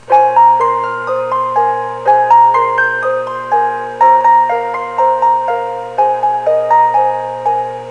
Gay Music

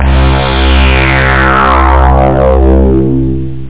trance sweep; algomusic

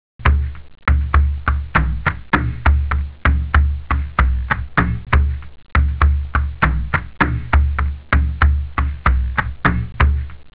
drums; OctaMED SoundStudio V1

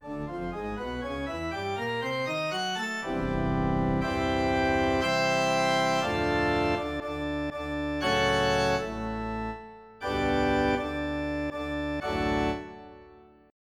Pipe Organ; Pipe Organ 1

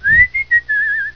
Siren; IQ2 Demo

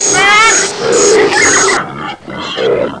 Animal Cry

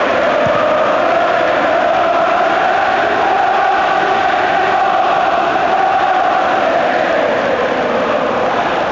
chants; StarsLeague

